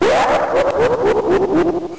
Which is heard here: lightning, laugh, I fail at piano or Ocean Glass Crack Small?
laugh